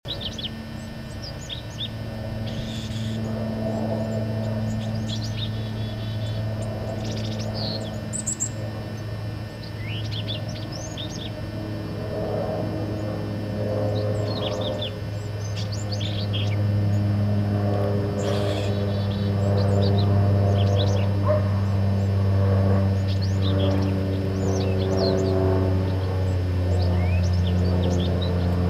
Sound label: Wren Babble